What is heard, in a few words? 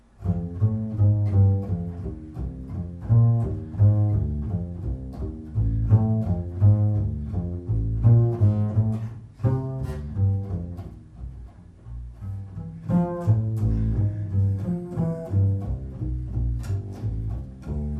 walking bass blues half time up tempo